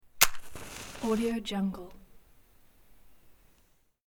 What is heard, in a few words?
lighting a match